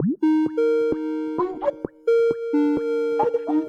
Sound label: call incoming loop